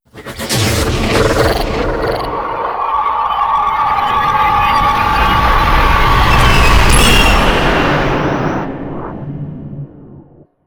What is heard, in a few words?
fly shot